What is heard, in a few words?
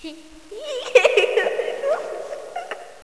giggle